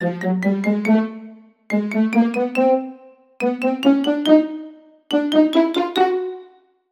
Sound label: cartoon ascend climb orchestral sequence; zapsplat